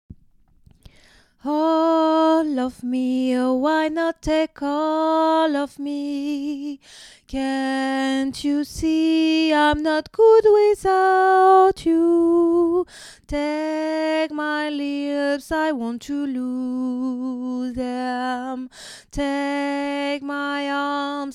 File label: alto